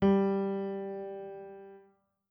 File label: piano g1